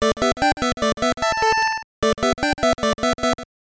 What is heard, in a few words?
Jingle; densha de go 2 gbc 2000